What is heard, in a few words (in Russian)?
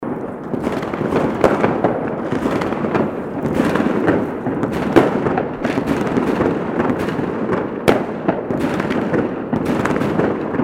zvuk fejerverka